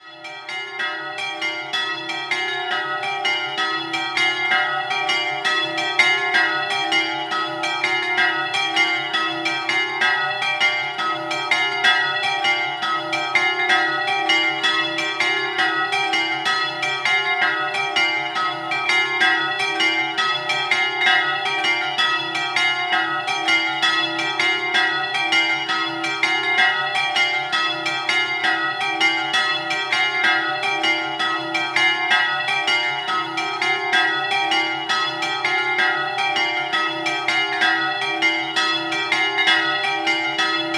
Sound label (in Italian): Ceolini, campane